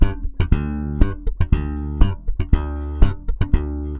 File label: SM BS G, BASS SAMBA